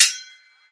mech korotkij udar

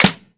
malij baraban odinochnij hlopok

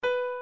zvuk noti si

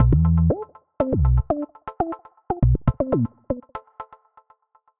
korotkaya petlya barabannogo ritma dovolno zapominayushayasya

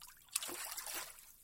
legkij vsplesk volni

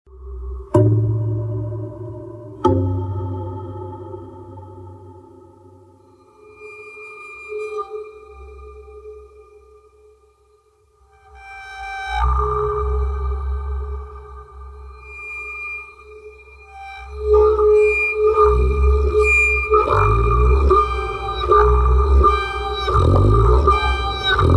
mrachnij film uzhasov embient

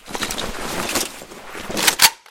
vigruzka pulemeta iz mashini

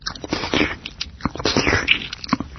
hlebanie s proglativaniem

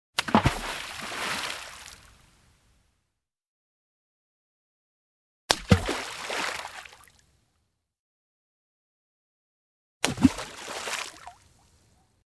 kamen kidayut v vodu.

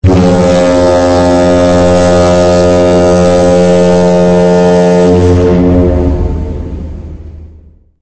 signal ob okonchanii perioda igri v hokkee

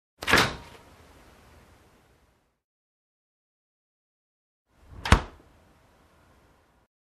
holodilnik otkrivanie zakrivanie dverej

